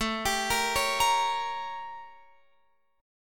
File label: A 7th Flat 9th Guitar Open F; A7b9; arpeggiated